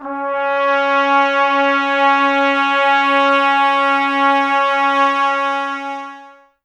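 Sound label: brass trumpets C; 90 s Sample CDs; Miroslav Vitous; Woodwind Brass Ensemble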